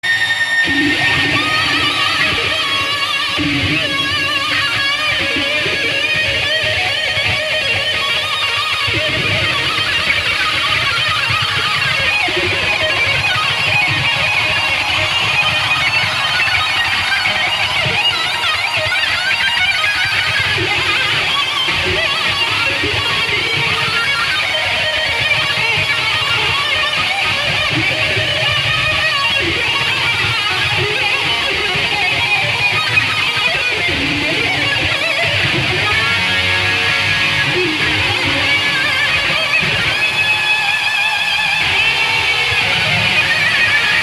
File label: Guitar Solo